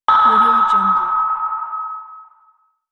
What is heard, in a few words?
sonar; mvpsfx